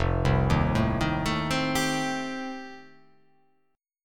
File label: F 9th F, chord